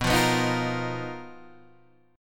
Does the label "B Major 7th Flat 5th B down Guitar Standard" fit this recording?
Yes